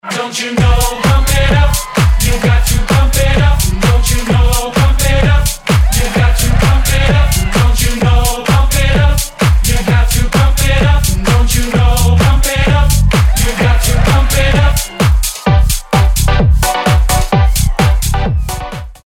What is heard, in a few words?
Pump it up BEAT